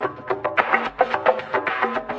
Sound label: megafiltered ethno, drum loops and breaks, 109 bpm; 101 to 110 bpm